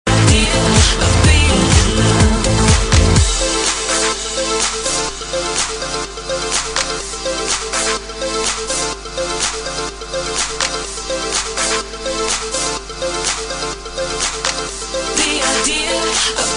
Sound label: drum idea